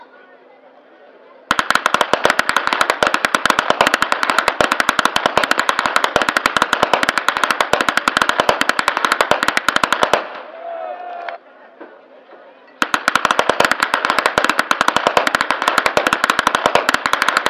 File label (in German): schnalzen, flachgau